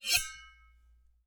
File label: Metal; TOOLKIT vol.2; DET24 ElementalMysteries